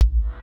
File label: bass tick; AOS RF1 Kart Demo